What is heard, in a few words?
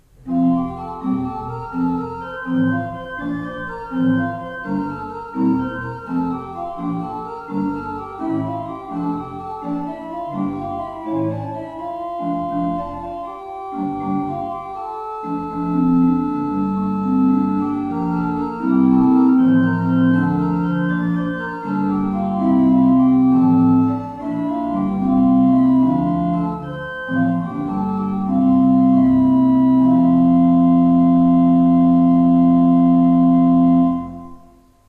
Ott, pipeorgans